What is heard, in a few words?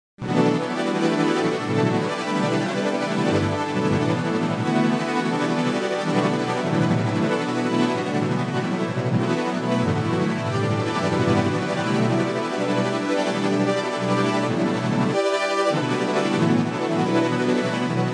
granular fifth, Pads